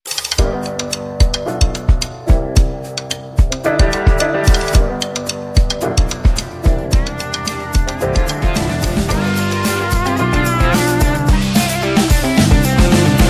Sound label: Only Happy Blues